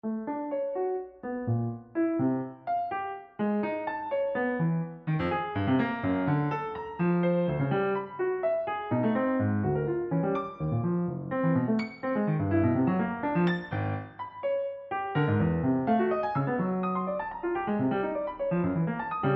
Miniatures for Piano

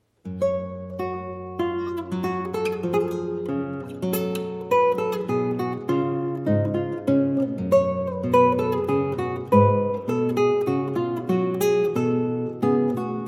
menuet in a minor; hwv549

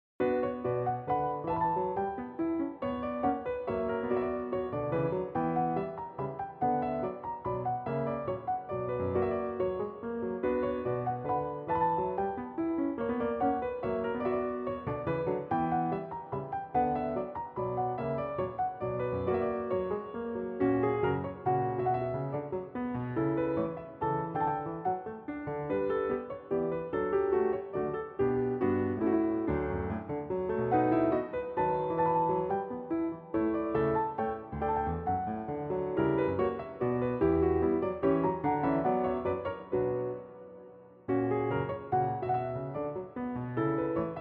menuette

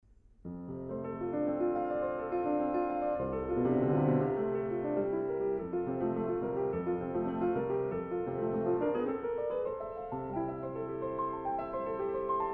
Prelude in F major; Chopin; no. 23

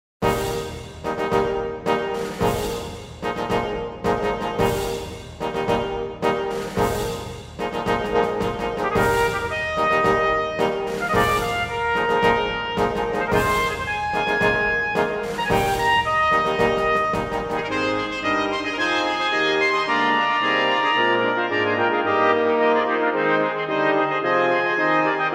Fanfare

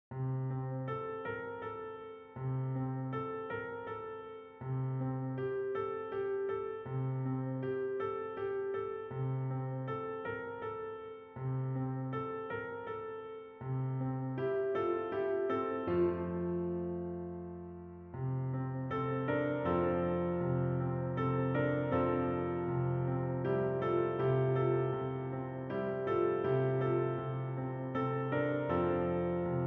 Prelude Berceuse

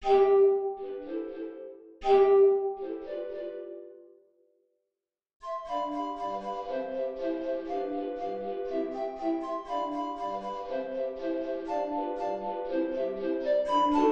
Pizzicato Polka

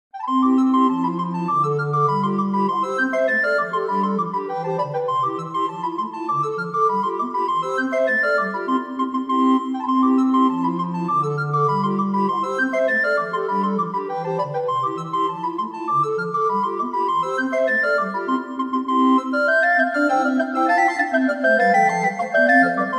Hornpipe for 4 Recorders; Murphy's Hornpipe

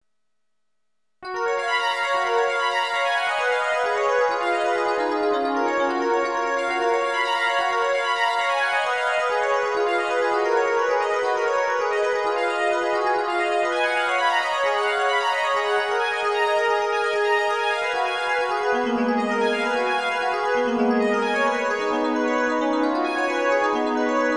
Preludes No. 13 in Gb, piano

